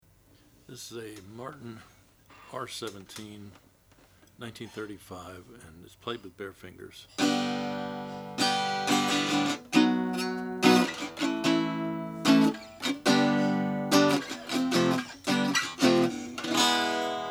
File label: martin r 17 natural mahogany, Guitars Archtop Electric & Acoustic; C.F. Martin R-17 1935; In 1934 Martin introduced the R-17 archtop, flat-b